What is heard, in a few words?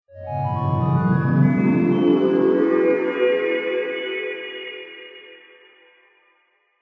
fantasy magical mysterious burst